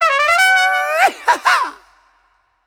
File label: bigwin; Fruit Fiesta; Wazdan